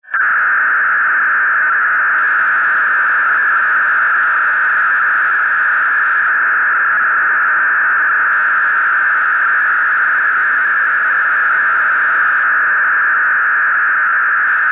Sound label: unknown fsk ofdm; OFDM Unknown 1